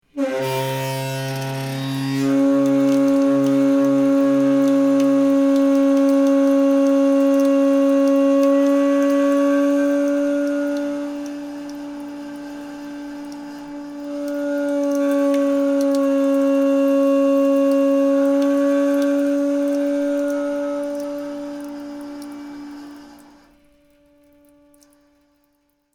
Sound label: janitor sink